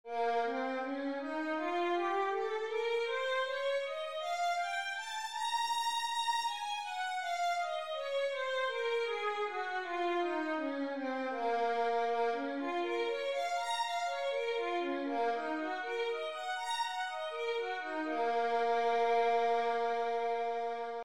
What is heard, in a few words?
violin b flat minor, scales 2 octave